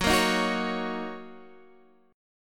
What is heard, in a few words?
Gb 6th Gb down Guitar Standard; strummed; JGuitar